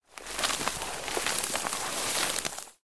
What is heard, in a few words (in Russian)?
shurshanie listev pod nogami v osennem lesu